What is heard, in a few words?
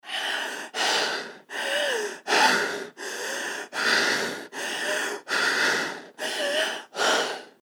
deep breathing